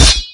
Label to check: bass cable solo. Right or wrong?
Wrong